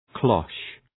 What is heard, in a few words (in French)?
cloche